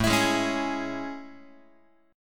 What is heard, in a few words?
A Major 7th A, Guitar; AM7 strummed; x,5,7,6,5,7; JGuitar